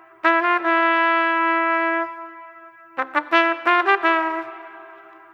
Trumpet Solo; труба

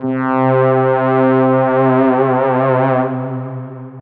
Soft Strings; Deep House

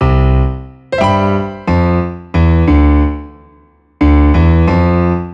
Upright Piano

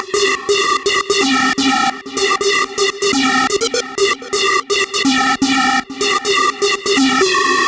Ear Scraper, big beat

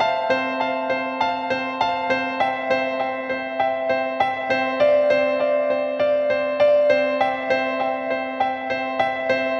Melancholy Piano, metal

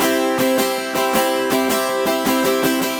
Mando

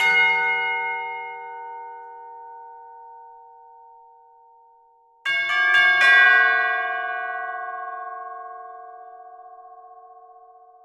Chimes

